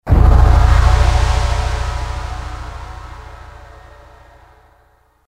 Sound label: Gong, Kawai K1m